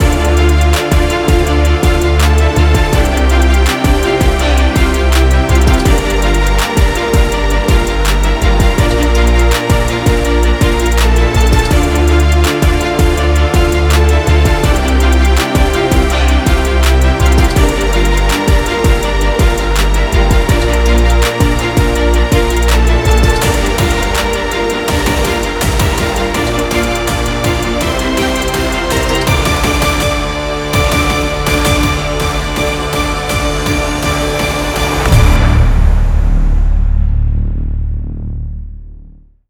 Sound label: tension violin; By Burak Sound Bank; horror excitement thriller song